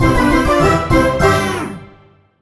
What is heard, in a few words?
Jingle Ingame Start; Cookie Run Puzzle World